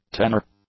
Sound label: tenor; nouns